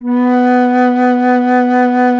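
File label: flute; Roland - LA Composer vol. 1; WOODWINDS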